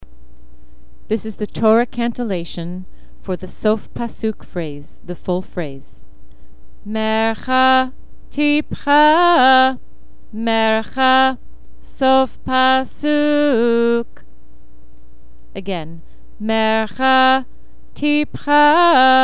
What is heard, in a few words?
Trope Sof Pasuk phrase